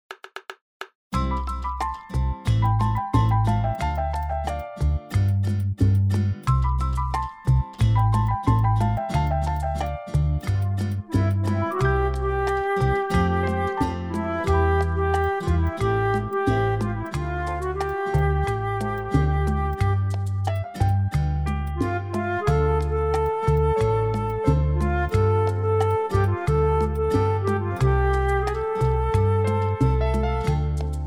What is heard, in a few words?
C play flute reference